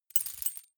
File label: key ring large rattle air soft; objekte; schluessel